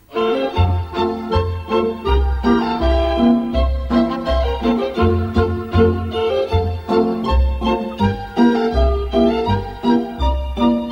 Munchner Polka, Allemagne

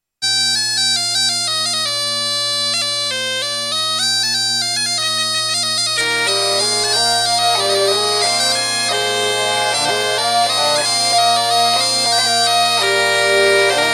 Valse, TRAD; Valse Plume & Le Rouge gorge ecoute

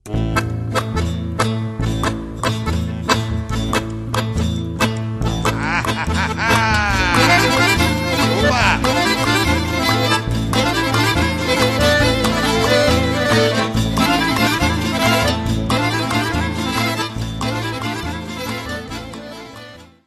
Maneaua, Roumanie

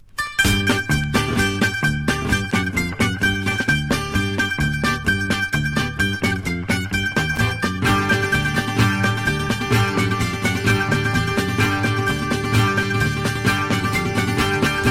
Grece; Tubutubuza

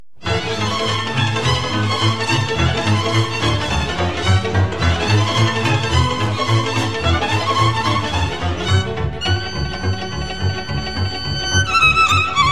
Sirba Munteneasca - Roumanie

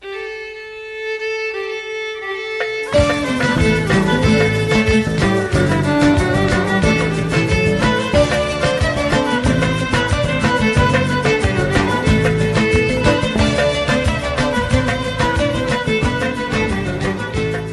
Turquie

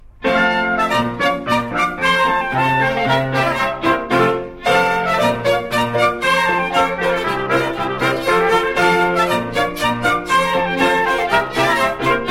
Maclote de Bal Populaire Liegeois, Belgique

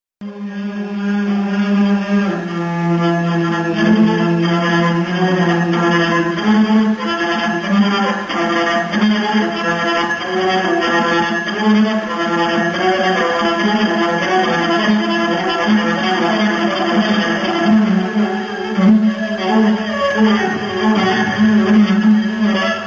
instruments cimboa

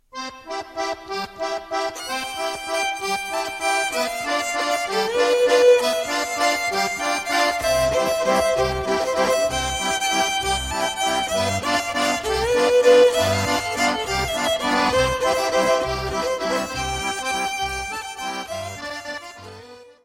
Valse - France; Valse des Balancoires

